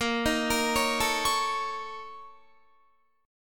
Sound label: Bb Minor Major 7th Double Flat 5th, Guitar; BbmM7bb5 arpeggiated